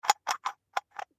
Stone Container, Stone Container Close Lid Scrape Small Box; Household; Blastwave